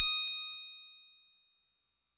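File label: Fuzz Clav DX100 D#0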